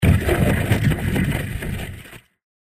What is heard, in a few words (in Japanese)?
土魔法 中; 魔法効果音